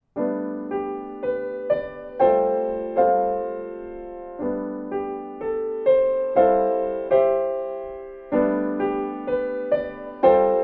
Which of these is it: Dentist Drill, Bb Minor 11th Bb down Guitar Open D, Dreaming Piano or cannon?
Dreaming Piano